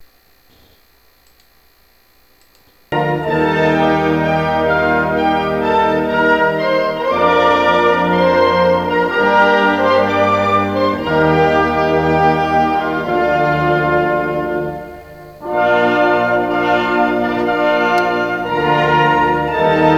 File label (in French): marche